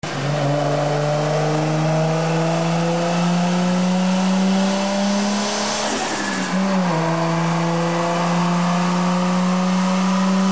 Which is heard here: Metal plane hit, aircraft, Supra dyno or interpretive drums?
Supra dyno